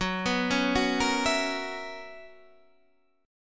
B Major 7th Suspended 2nd Suspended 4th F sharp, chord shape